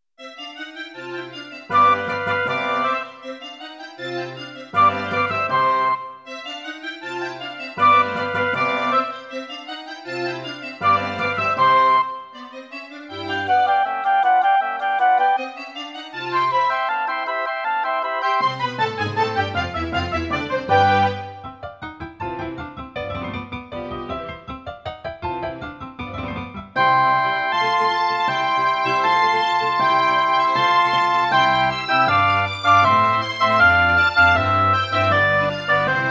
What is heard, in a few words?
Roland SD-90; classical; GS MODE; Mozart; Concerto K467 partial